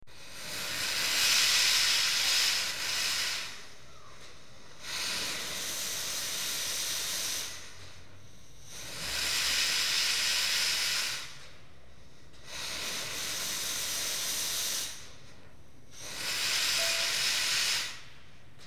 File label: Static Steam Engine; BBC Sound Effects Library; Age Of Steam